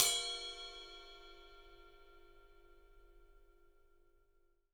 PW RIDE, RIDE CYMBAL, 3D Drums; 3D Sound

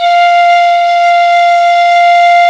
PICOLO 1 F3, PIPE; AKAI S-Series CD-ROM Sound Library VOL-8

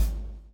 BLOW; Spectrasonics - Backbeat

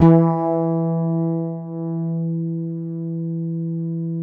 MIDIFRET E 3, Bass; Masterbits CLIMAX 7; MIDIFRETLESS

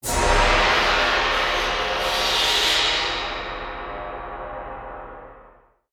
Crash, impact samples

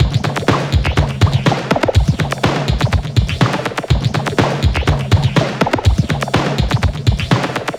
Filter Loop, Drums & Percussion, Electronica

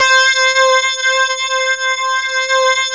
METAL HARP

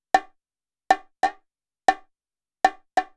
CONGA, Techno 138 BPM; KOELN; Best Service ProSamples vol.54; AKAI

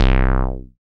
SYN TB SUB, Roland TB-303; Sound & Vision - Gigapack I CD 2

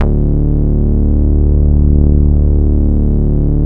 MOOG D2MP; 90S MOOG

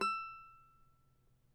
CAVAQUINHO E, Guitars; Spectrasonics; Hans Zimmer Guitars VOL-2

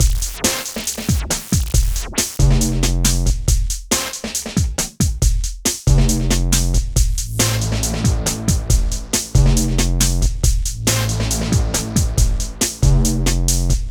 Hardcore Breakbeat, 138 Amin; Big Fish Audio; Hardcore Breakbeat Action; E-thereal

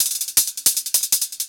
Hat 160, Drum&Bass Perc Loops; EZRollers

